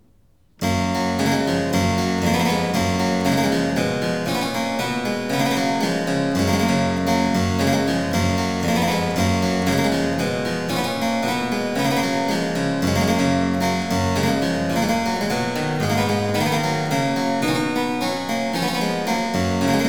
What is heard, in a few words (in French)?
Pieces de clavecin; Fransua Kuperen; Razvlecheniya dlya klavesina; Second Livre 7eme Ordre